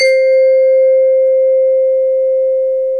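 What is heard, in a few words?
TONE C4, vibraphone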